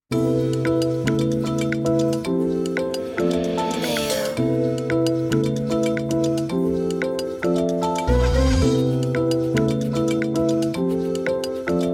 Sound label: drumless full MIX